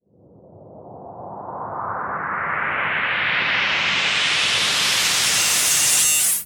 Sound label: FX Sweep Up; Vengeance - Essential Clubsounds Vol.3; Sweeps Up